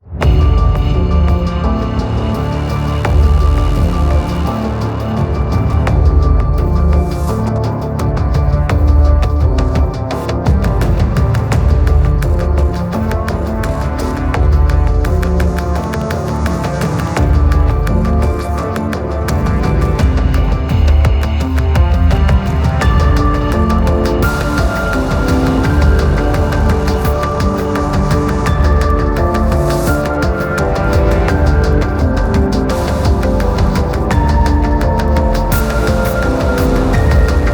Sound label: Login Music; Login Screen; Deadlock